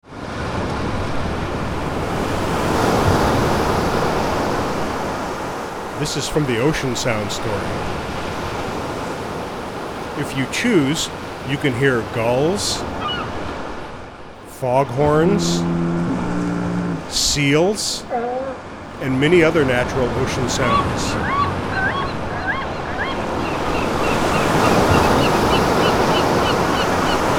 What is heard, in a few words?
ocean